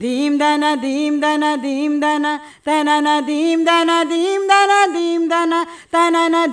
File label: Deemdanna, Vocals, Female; Sonic Foundry (Sony Creative Software); World Pop